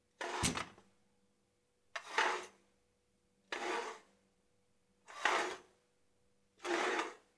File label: Chair On Tiles Fast